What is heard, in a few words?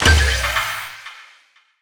Hero Death FX - GMaj9, Video Game; Cymatics; Video Game Sample Pack Vol 1